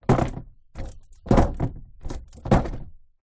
foley card box packageing plastic window down on ground